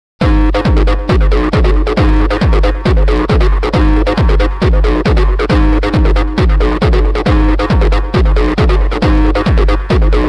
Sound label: hardbass; taunts